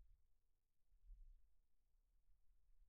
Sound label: Kick In, 160 BPM